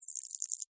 Insect Voice Click, Insect, Voice, Clicks, Vocalization, Bug